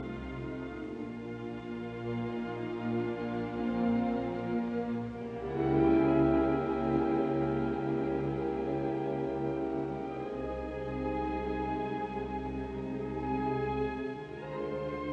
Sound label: Symphony in B flat major Larghetto; Schumann; Op 38; Spring; Max Fiedler